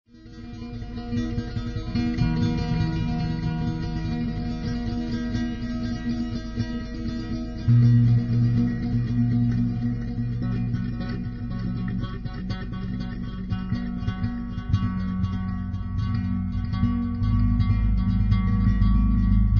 guitar

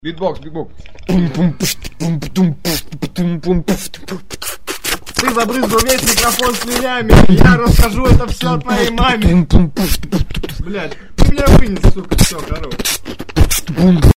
beatbox skit

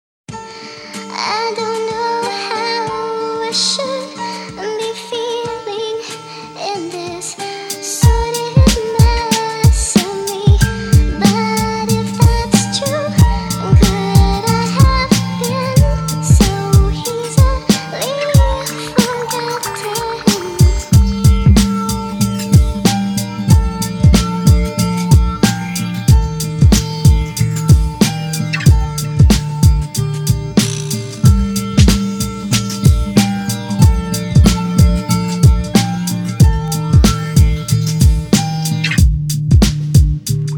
minus animal rap

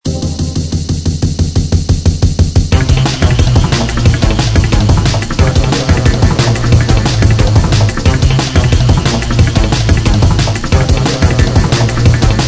funk this beat

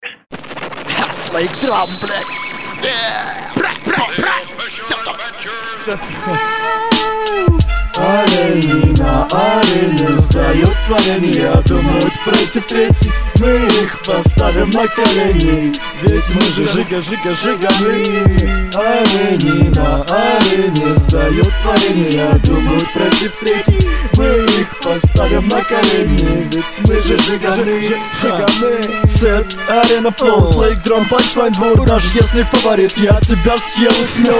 drum

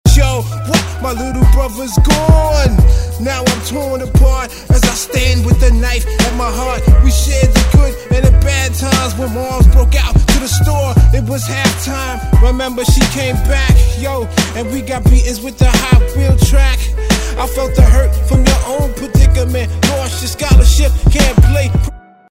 piano

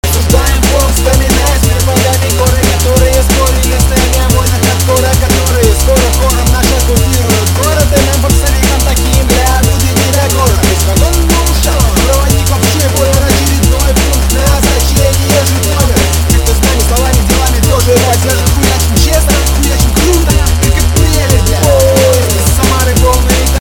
dnb

